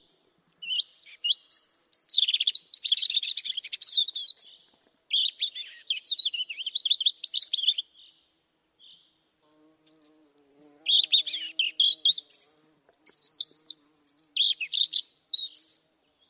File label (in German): rotkehlchen